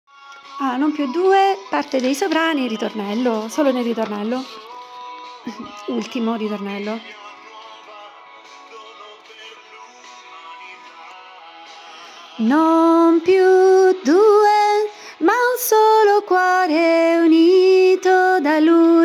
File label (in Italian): Soprani